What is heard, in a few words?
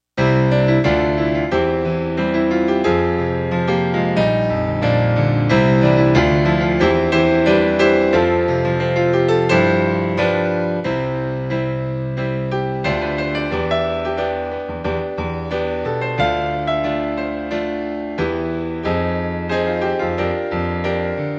Rock Ballad